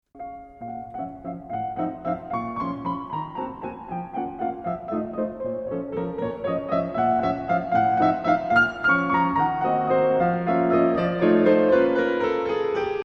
ornament

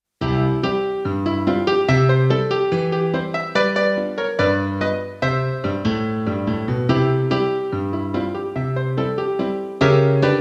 ragtime

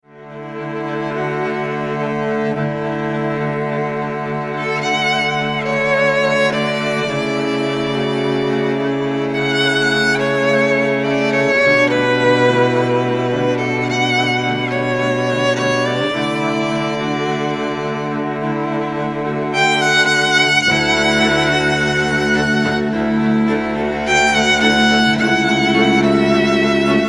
string quartet

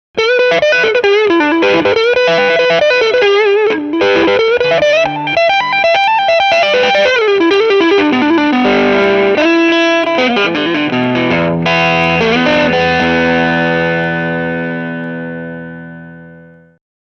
electric guitar

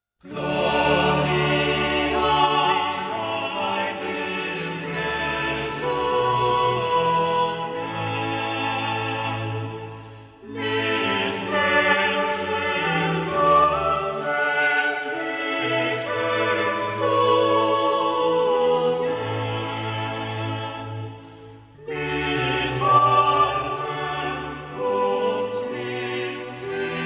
chorale

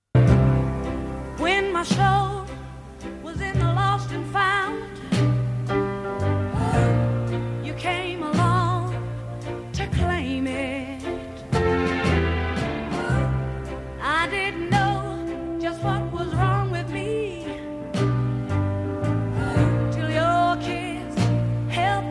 soul

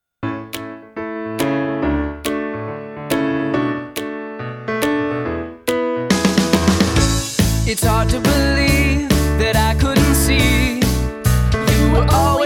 musical

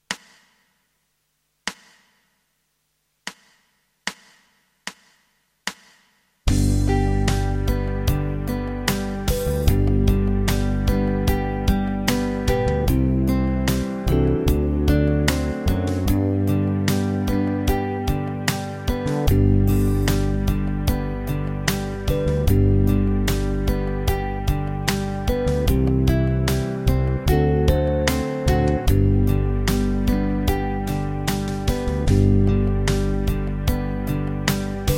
Guitar backing

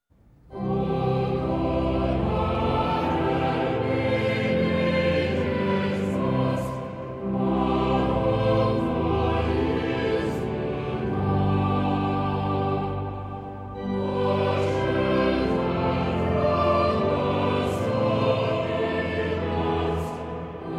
descant

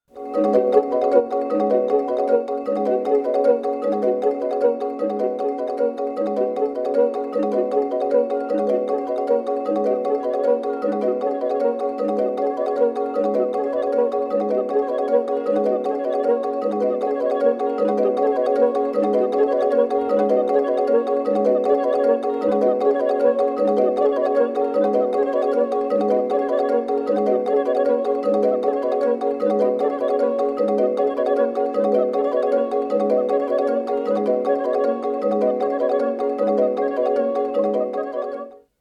minimalist

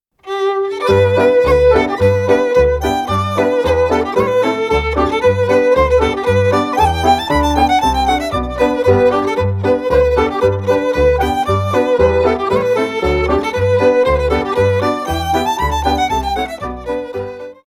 fiddle

